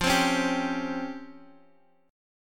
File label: C sharp Major Sharp 11th F